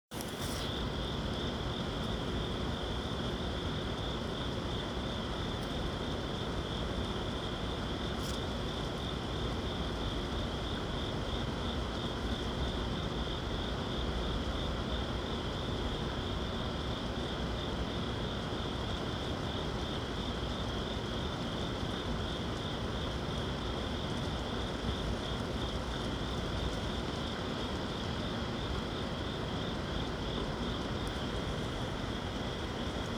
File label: Crickets in NYC